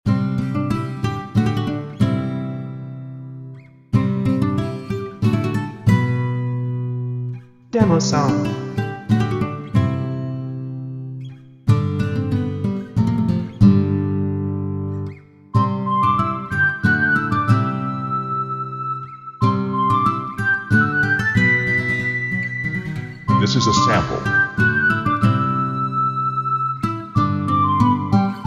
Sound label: Instrumental Loop